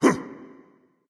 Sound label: grunt jump; smite; xingtian